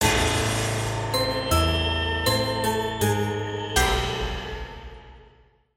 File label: Creepy; Worms W.M.D